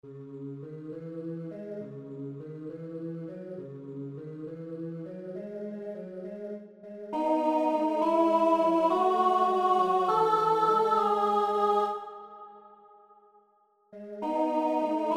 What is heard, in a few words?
Domine ALT